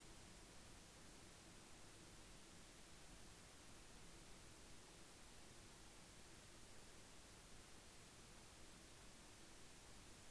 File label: UM900 Noise